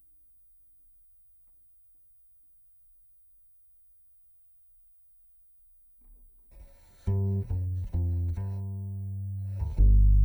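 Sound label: Contrabass DI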